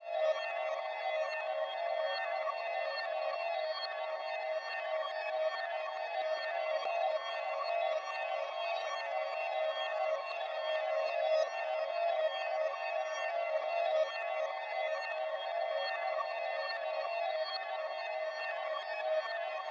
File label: Guzheng Texture Reverse; Vignette Kit